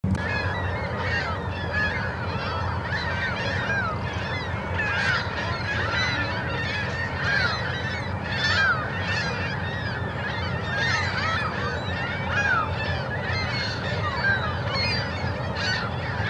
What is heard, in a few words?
Birds in Columbia Park Kennewick WA